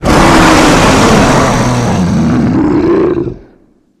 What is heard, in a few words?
dragon dying